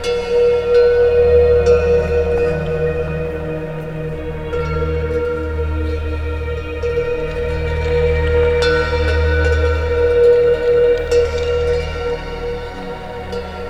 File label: Synvoxperc Scape